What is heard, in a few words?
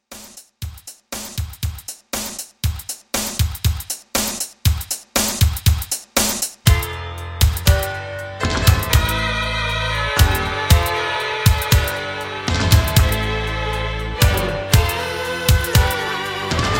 80s Pop Re Drum